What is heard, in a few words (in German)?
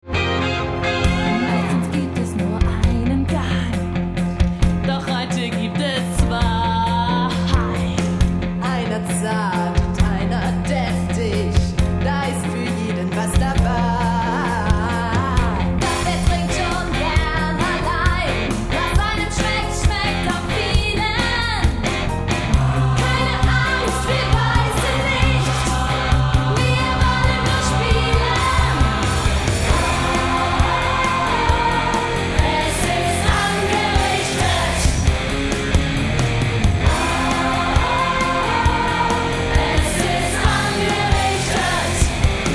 jingle